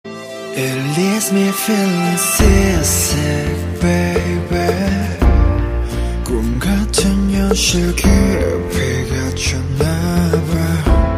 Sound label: Heartbeat